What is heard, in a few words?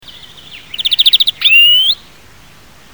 Carduelis chloris